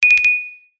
multimedia notification bell chime ping ring tone

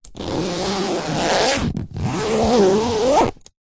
foley suitcase zipper

